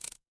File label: office pen